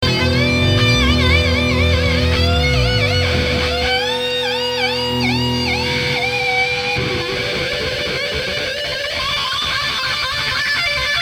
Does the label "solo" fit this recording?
Yes